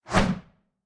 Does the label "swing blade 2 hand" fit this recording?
Yes